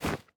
Step Walk Snow; SandBox Musics

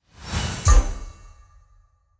Event Objective Received; SandBox Musics

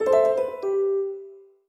Event Objective Loss; SandBox Musics

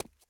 Run; SandBox Musics

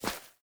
Step Walk Grass; SandBox Musics

